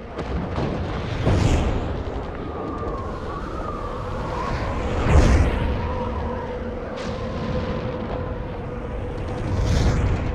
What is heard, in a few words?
Guard Towers Ext Ambience; Starfire